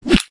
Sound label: arrow; spaghetti